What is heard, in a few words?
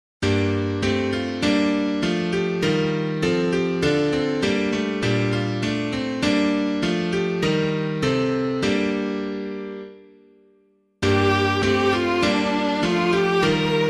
piano, hymn; Come Thou Long-Expected Jesus; Wesley; JEFFERSON